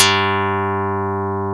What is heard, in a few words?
HARP KARPLUS